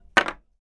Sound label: Small wooden blocks set down; wooden sound effects